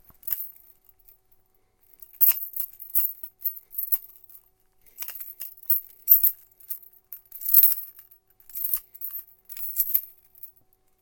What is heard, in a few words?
metal